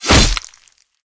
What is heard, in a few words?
sword chop; weapons; blades; M9K Specialties